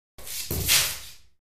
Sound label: Ping Pong Paddle